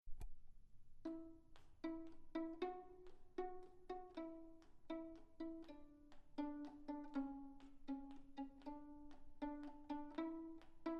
Juringius smålandspolska slängpolska från småland Pizzicatto

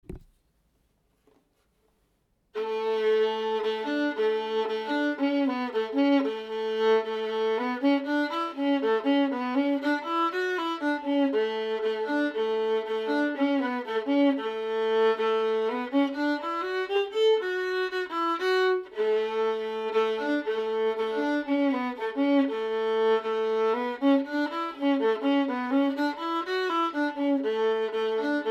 Reinländer stämma

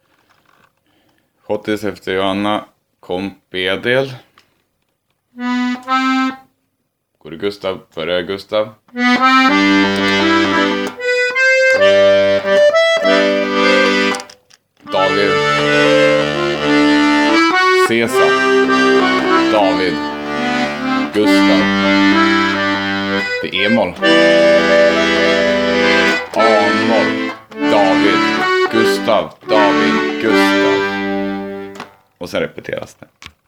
Schottis komp

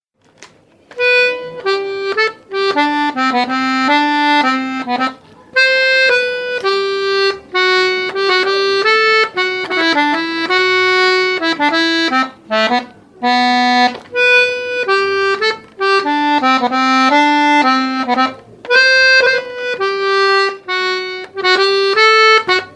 Hambo stämma; Vigers

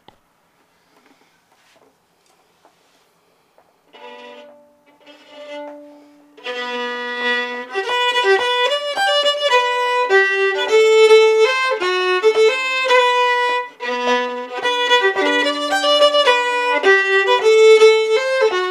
Pillisnutten stämma

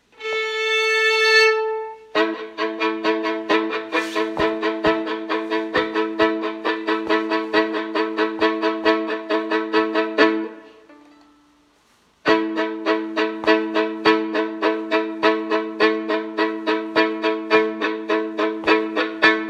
fiolkomp öva tajming

